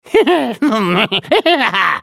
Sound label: laugh; Twitch; en US